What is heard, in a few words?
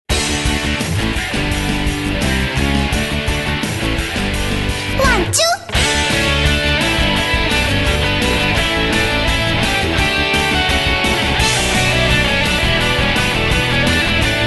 OP Short Vocal